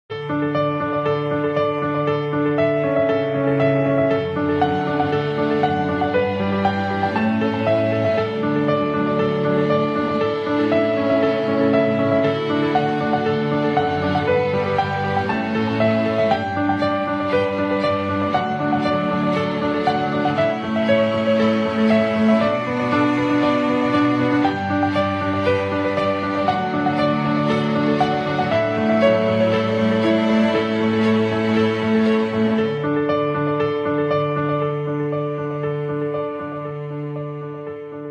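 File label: prelude samsung, ringtone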